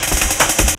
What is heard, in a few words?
Fill, Drum N Bass Percussion Fills n Breaks